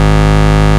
Ultimate Bass G# Root G#; Vengeance Samples

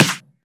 Snare; Vengeance Samples

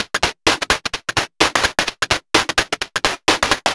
Electro Loop 128BPM; Vengeance Samples

